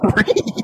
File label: goofy ahh laugh; quandale